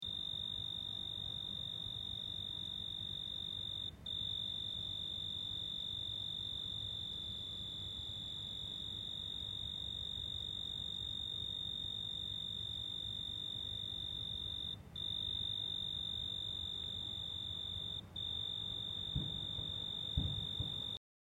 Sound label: ANIMAL SOUND CRICKET